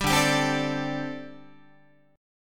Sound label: D 7th Suspended 4th, down, Guitar; strummed; JGuitar